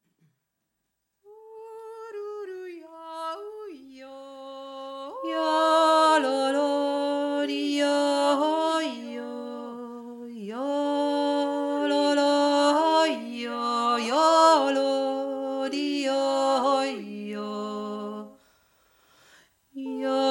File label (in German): naturjuuz untere stimmme; obelwaldner